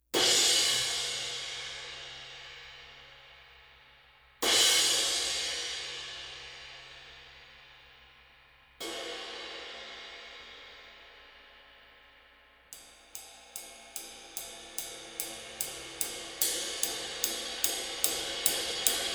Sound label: Sabian 22 Legacy